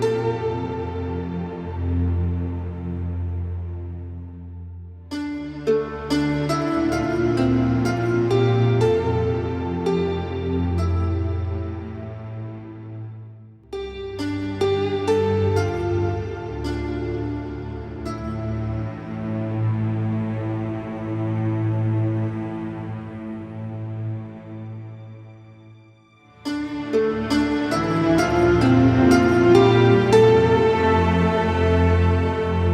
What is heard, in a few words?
Music; Vitruvian Seq Intro; Warframe; Cinematics; The Sacrifice; Sacrifice Quest